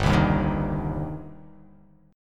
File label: G Suspended 4th Sharp 5th G down; strummed